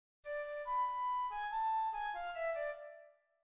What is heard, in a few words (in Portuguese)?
Sonata para flauta em mi maior; Bach